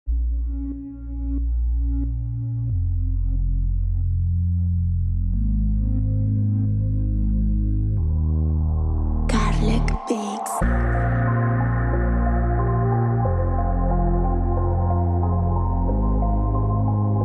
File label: Beat Reggaeton Instrumental; Prod. Karlek